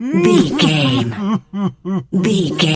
voice male cry low

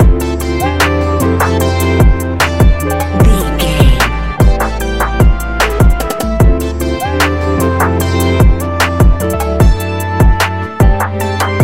A relaxed and chilling piece of music; A relaxed and chilling piece of music, great for light documentaries, background music for tv shows, lift

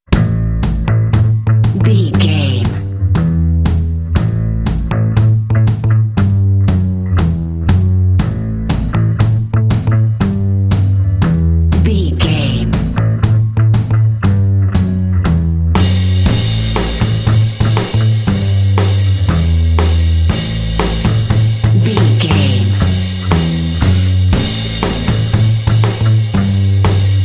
noise

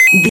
retro collect pickup coin

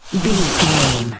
fireball conjure

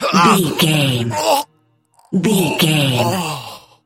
voice male death

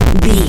retro explosion bass

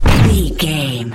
energy blast small

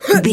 voice female effort jump action

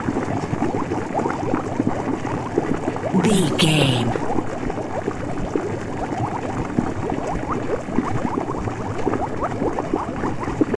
potion bubbles brewing loop

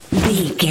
foley jump movement throw

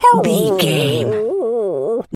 dog hurt whimper howl long

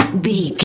metal tin impacts soft

